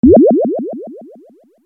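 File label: liquid bubbling short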